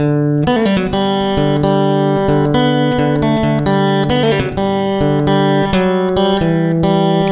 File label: guitar normal